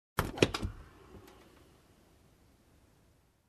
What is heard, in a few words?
Plastic button pushed down